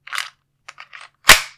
gun reload

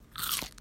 crunch

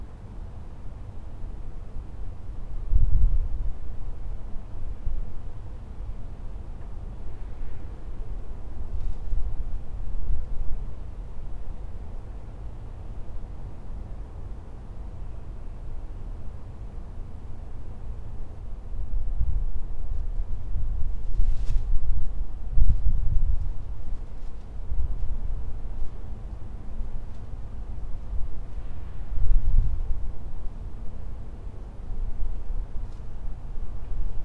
wind background noise

